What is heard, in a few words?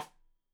Side Stick Stereo; Deeply Sampled Drums